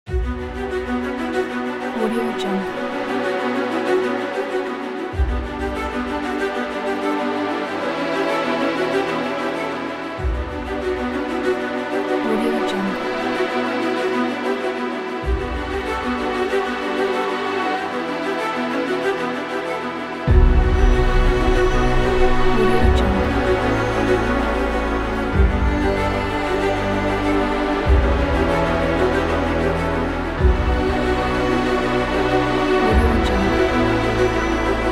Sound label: war drama emotional soundtrack